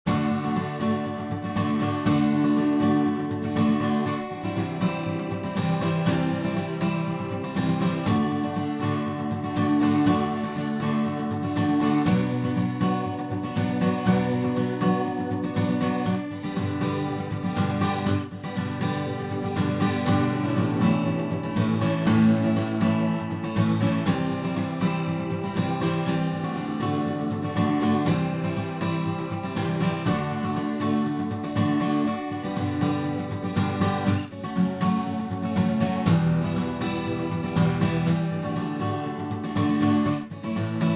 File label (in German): Akustik Gitarre Doubler Chorus; Softube